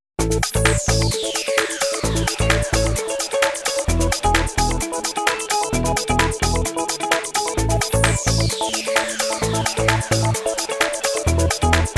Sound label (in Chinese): 专题片背景音乐